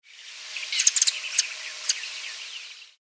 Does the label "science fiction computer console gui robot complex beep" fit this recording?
No